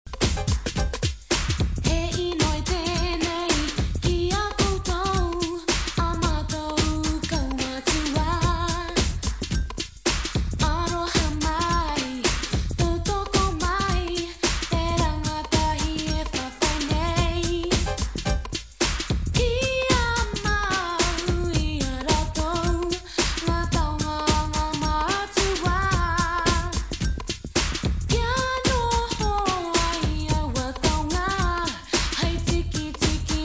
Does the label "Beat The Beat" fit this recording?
Yes